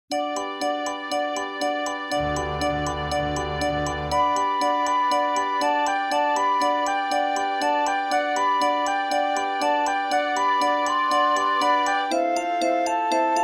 sopraan; Flierefluiter 6; Inside Out

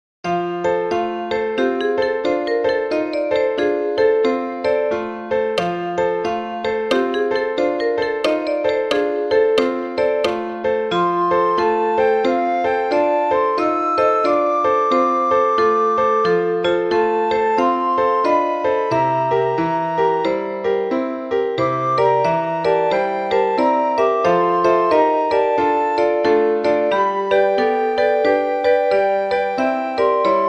sopraan; Flierefluiter 5

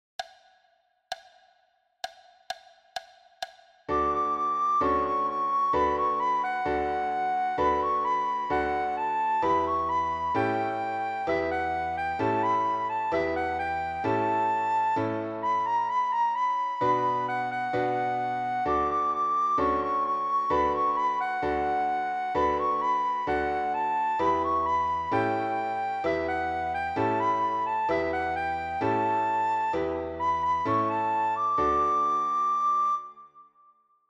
sopraan snel; Flierefluiter 7

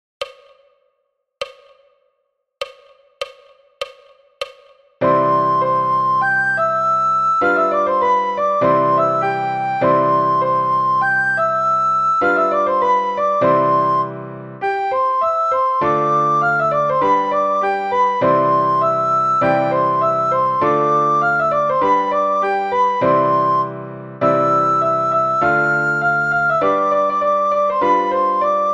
sopraan

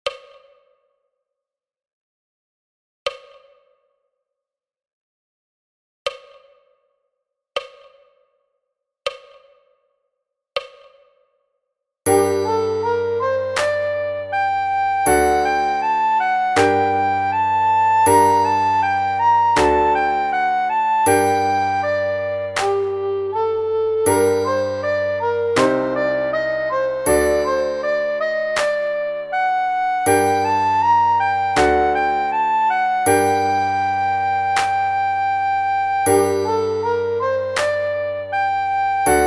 alt rustig tempo; Tureluurs 3

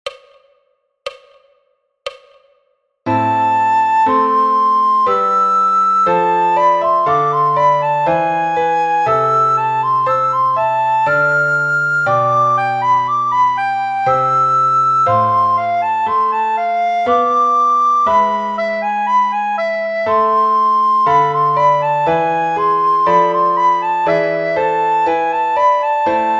sopraan; Flierefluiter 6

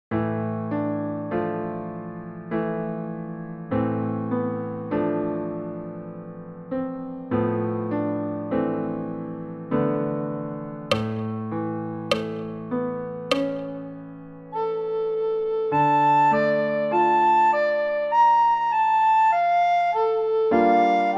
alt rustig

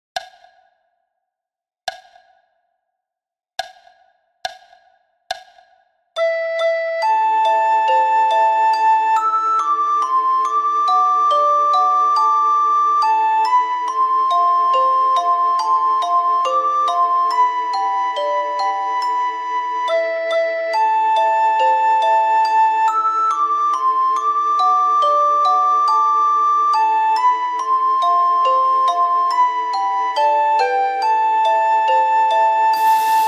sopraan; Flierefluiter 5

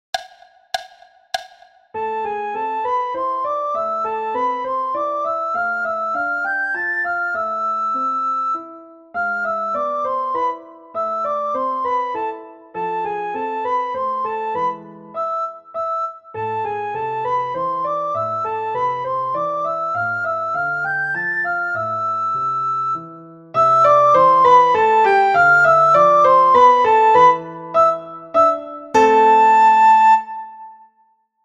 sopraan; Flierefluiter 6

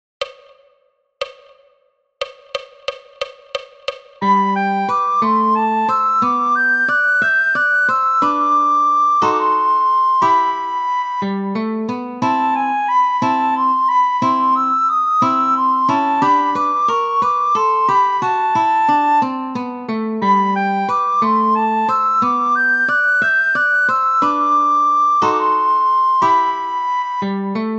Andante sopraan rustig; Carulli; Flierefluiter 7

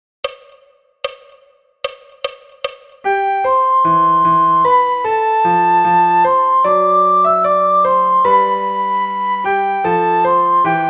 in c sopraan

